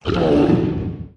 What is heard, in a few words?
injured